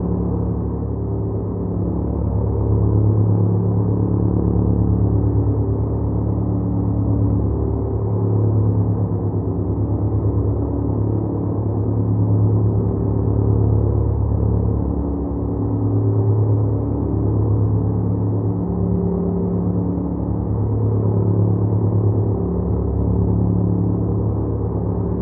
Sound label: airdrop plane loop; Rust